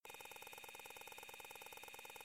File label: typewriter